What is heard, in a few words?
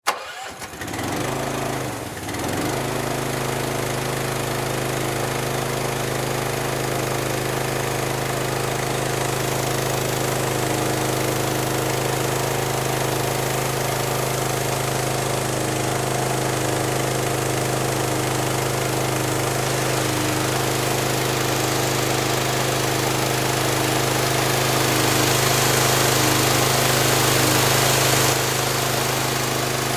Industry Power Washer On Run Off; Machinery; Machines